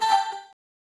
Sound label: board game